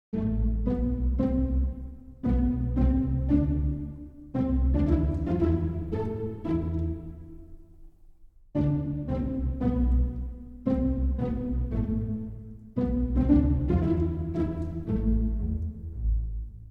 Burglar Or Sneaky Music Arranged For Pizzicato Strings; John Stepan Zamecnik